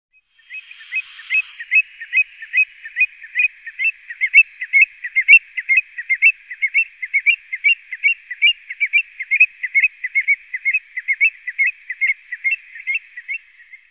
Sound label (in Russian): avdotka burhinus oedicnemus